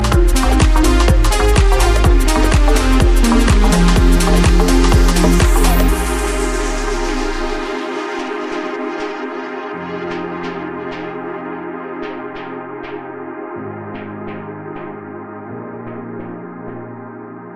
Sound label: fanfare remix